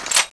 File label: ng7 clip in, weapons